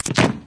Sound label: wall hit, headcrab poison; Garry's Mod; hl2